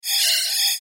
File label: Flash Animation Multimedia